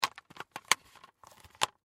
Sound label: Dvd Rental Case Plastic Close; Materials; Foley; Blastwave